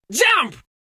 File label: Jump; Effetti sonori